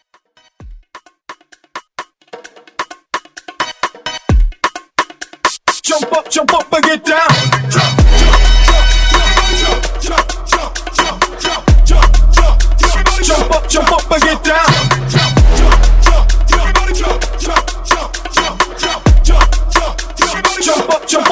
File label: Baile Funk Vocal Edit